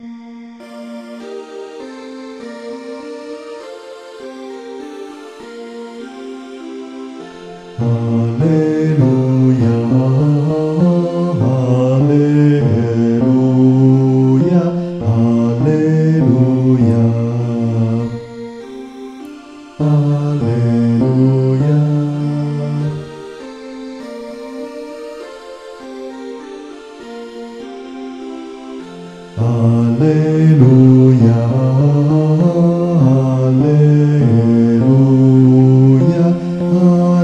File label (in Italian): Alleluia Bassi; Gabrieli